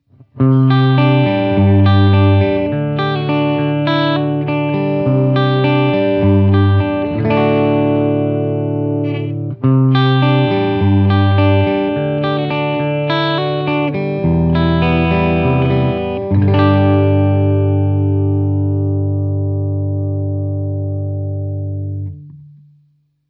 VOX Cambridge 50 Fender Deluxe Modeling

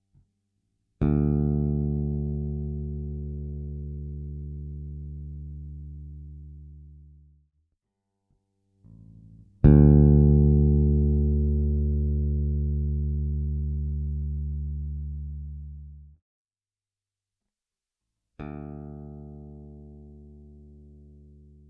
Warwick Gnome i Pro V2 Bass EQ

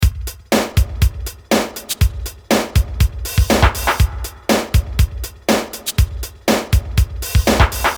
Loop processed

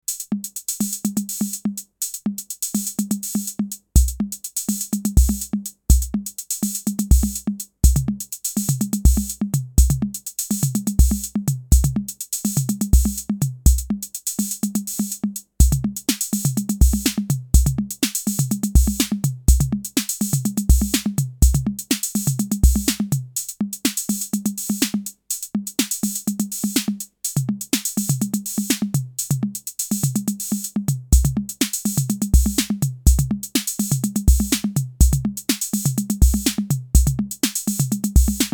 TT 606 Six Beat

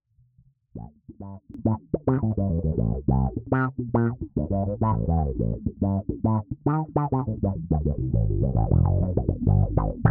FX comp

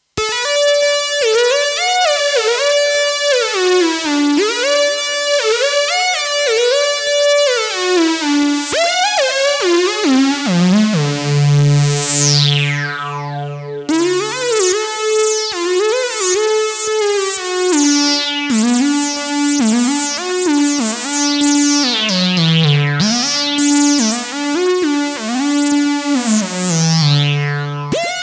Puls 2pole

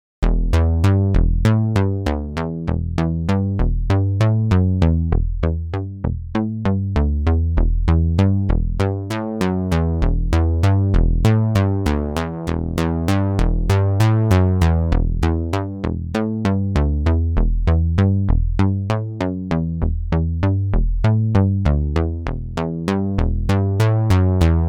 Eventide SP2016 Repro noFX

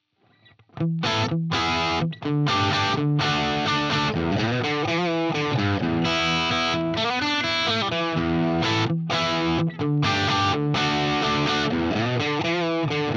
Harley Benton TE 90FLT bridge DIST

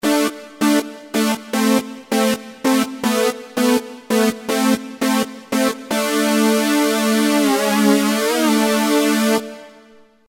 Fullsynth

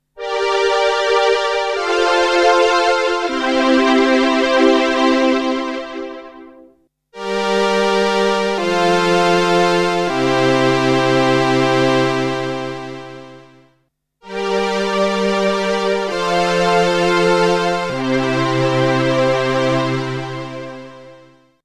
Polysix Poly Strings

